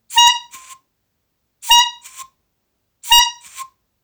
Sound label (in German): Hupe